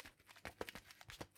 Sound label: walk; DanisRace